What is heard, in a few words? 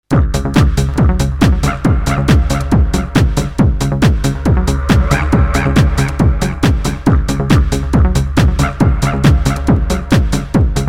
didge; didgedemo